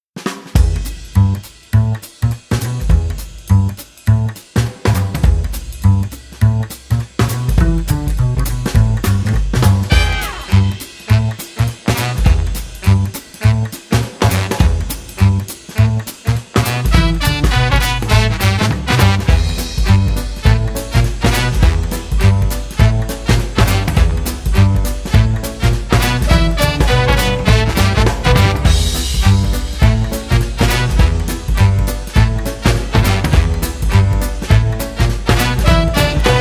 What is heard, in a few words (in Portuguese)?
fundo